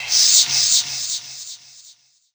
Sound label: Fx Vox; Equipped Music; Loungin House